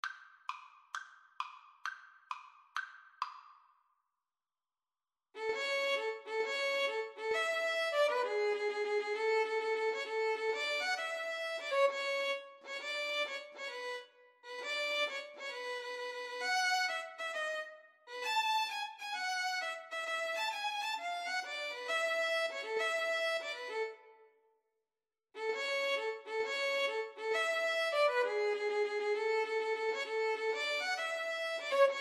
mendelssohn italian duet, Violin-Cello Duet

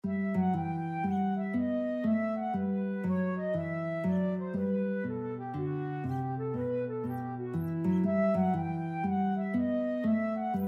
bouree flute guitar; bach

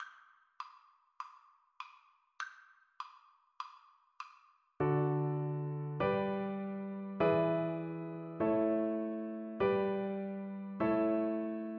Cello

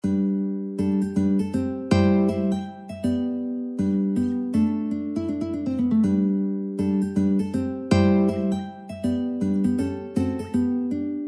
mandolin

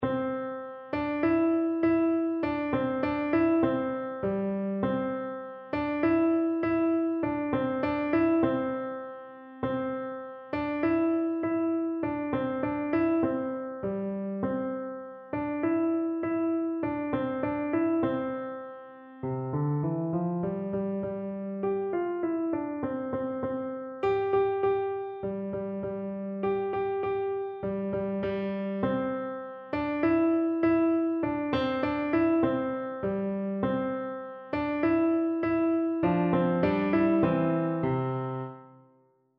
alouette PNO, piano

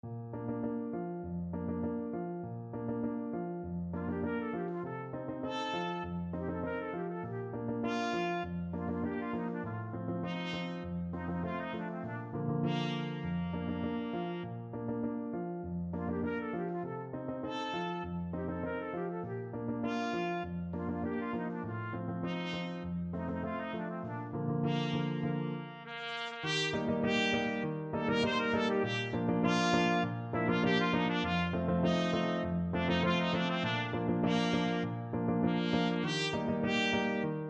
neopolitan, trumpet

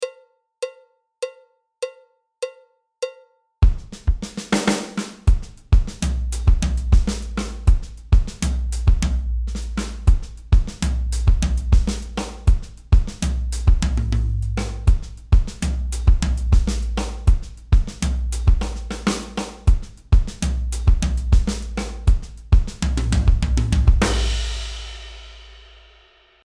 Funky Latin Variation, drums

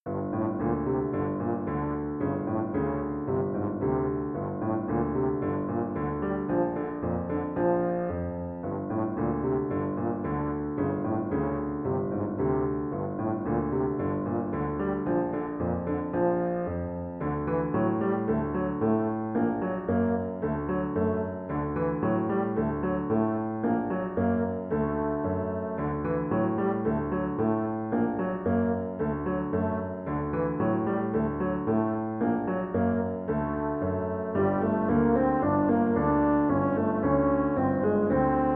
french horn

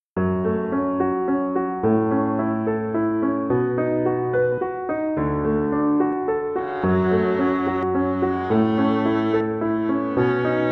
house of the rising sun VLN VLA duet; Violin-Viola Duet

